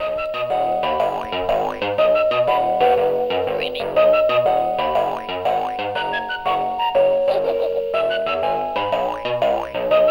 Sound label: Noisy Toy